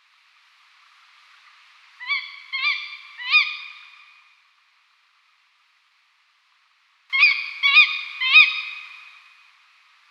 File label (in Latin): Strix aluco hlas samice